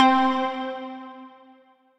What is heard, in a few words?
Pluck; B.Beetlez Pluck; Synth Misc; Ableton; Beat Tools